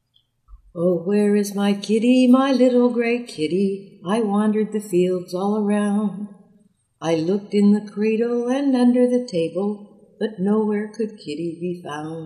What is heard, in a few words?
kitty song amplified